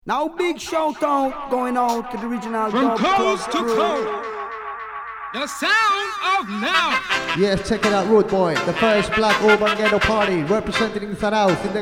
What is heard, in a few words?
jingle party